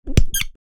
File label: High pressure handgrip sprayer single press; hand tool